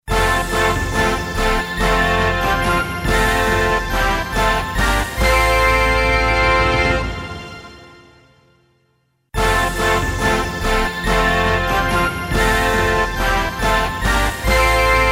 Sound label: Russian jamboree fanfare